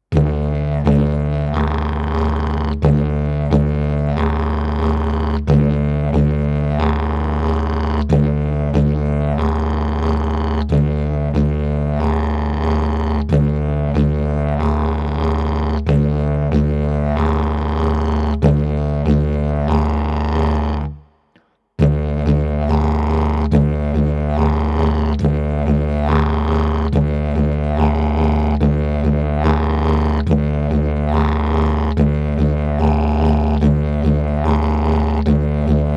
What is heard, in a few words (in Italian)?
ritmo livello principiante